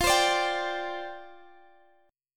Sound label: D-Minor 11th, F